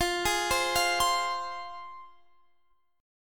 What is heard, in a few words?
F Minor, F, Guitar, Standard; arpeggiated; x,20,18,17,18,20; JGuitar